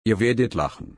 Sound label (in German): lachen